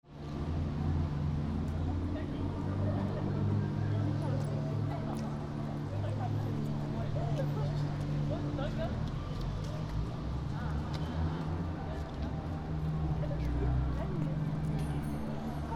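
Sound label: ekimae night, Fukushima Soundscape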